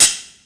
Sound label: kesi close